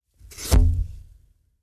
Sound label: Electronic sucking sound variant